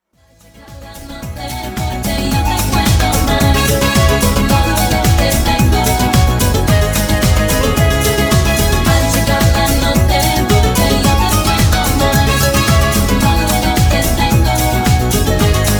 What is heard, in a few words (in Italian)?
Sueno Version Ballo Di Gruppo Canto